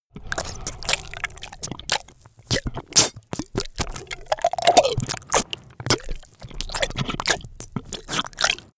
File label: monster flesh eating; koerpergeraeusche; comedy-cartoon; soundpool